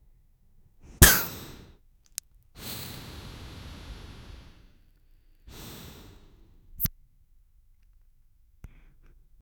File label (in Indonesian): suara hisap rokok dan; smoking